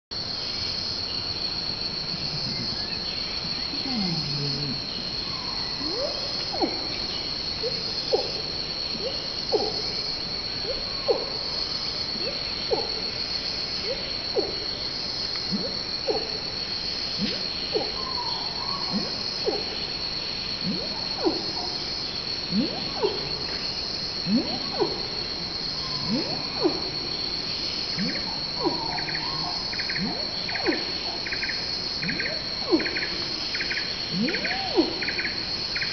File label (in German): Maennchen Longcall, orangutan